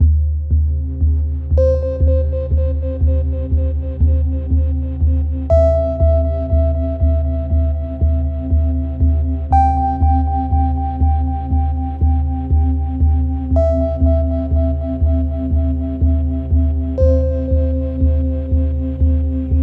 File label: The Forest Sings Theta Micro meditation